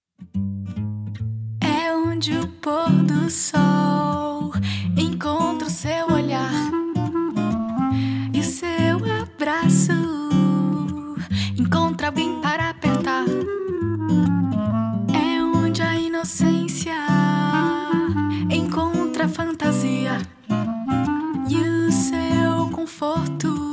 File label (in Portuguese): Jingle